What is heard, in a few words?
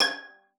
G#6, VIOLIN SPICC; Xsample VOL-5